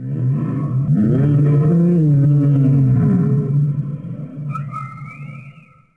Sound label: sea life; DAoC